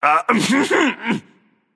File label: clear throat, voicepack; smite; jorm